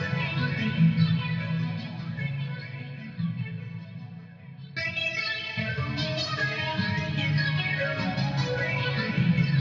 Synth Layer

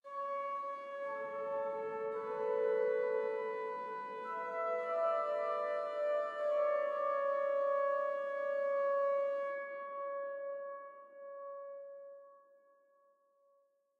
Organ High; That Dragon, Cancer

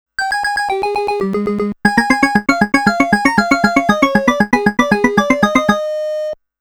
Game Start Music; Namco Classic Collection Vol.2; arcade

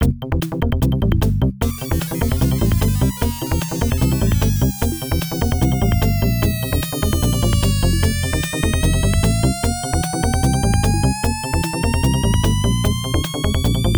Goal; super sprint; atari system 2; arcade

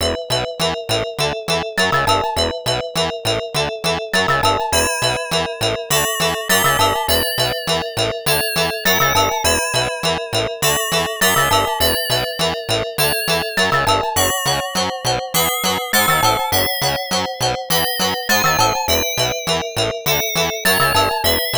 [Normal] Boss, arcade

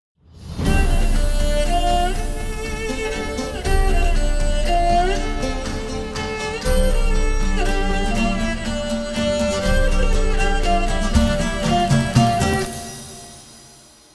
Race Intro; The Witcher 3 Wild Hunt

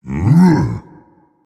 Vocal Colossus Pain Reactions

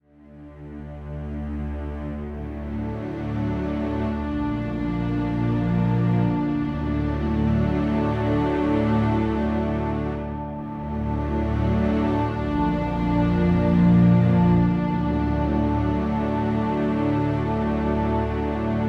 Win Ceremony Loop; Toussaint

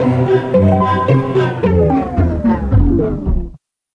Medieval Lose; Tanktics 1999 PC

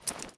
movement cloth; adams venture origins

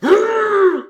pain mad; Mascot; Fight Knight

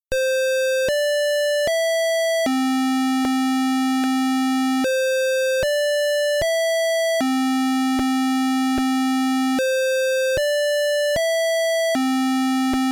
Speaker Test; Dino Rex

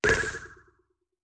bop

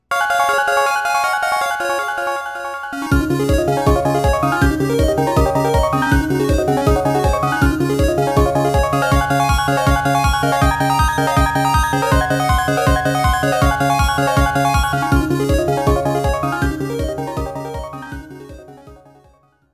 Slot Hit; Pokemon Diamond and Pearl Super Music Collection

